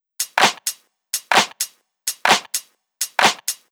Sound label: Loop, 128 BPM